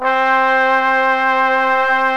ORGAN & BRASS

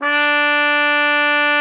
cup D4, cup mute; Xsample VOL-3

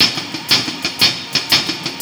Cymbol Particle; Particles

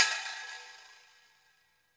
RECO, RecoReco; Club-50 - Foundations Roland; ExotcMetal

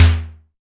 SIMMONS SD-5 drums; SDS5

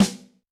Big Wood, Yamaha DTXpress-II snare

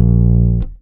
bass 4 (Bb) long note; Sonic Foundry (Sony Creative Software); Ugly Remnants VOL-2; Basstuff

